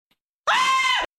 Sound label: ha scream